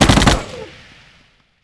Machine Gatlin Fire; Civ4 warlords

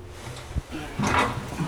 Vehicle reloads; Red Orchestra: Ostfront 41-45

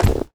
padding run

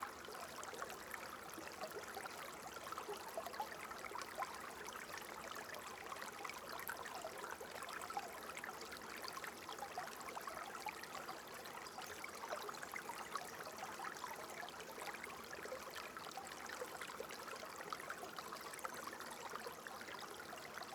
stream soft; Rust

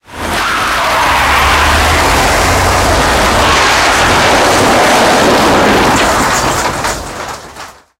turn wheel, tanks; War Thunder

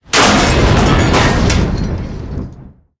tanks explosion metal; explosions; War Thunder

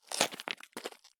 turn gravel; Escape from Tarkov

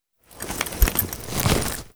foley grab knife; Modern Warfare

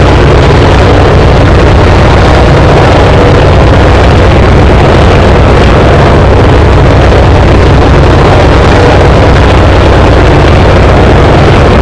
Aircrafts Engines DB605; DCS

